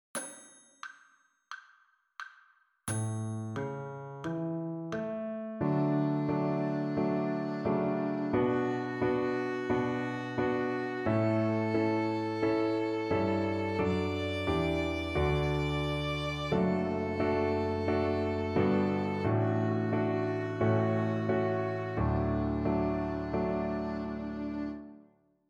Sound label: d major arpeggio